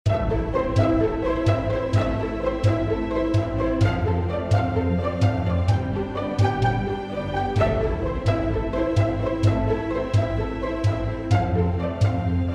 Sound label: Reflective